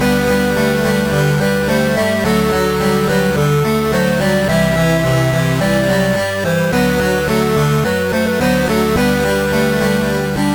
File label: flute chip, Trackers; Modland; flute-o-chip